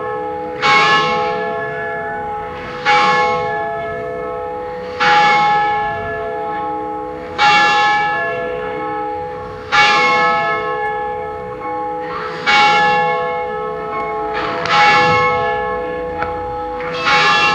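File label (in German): Kirchenglocken läuten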